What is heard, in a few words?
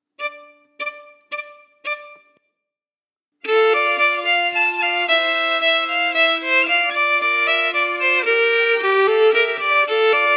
Vals melody emphasized